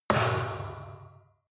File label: metal impact can large ring